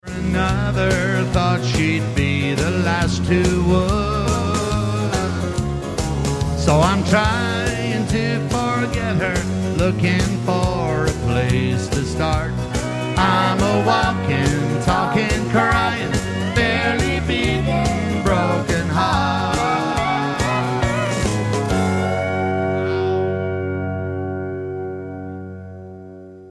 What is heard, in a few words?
Country